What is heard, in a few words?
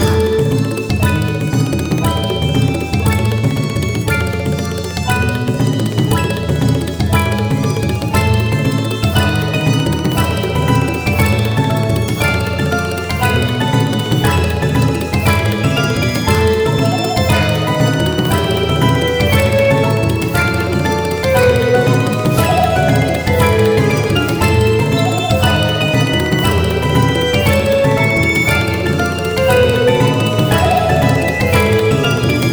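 free spin ambience; netent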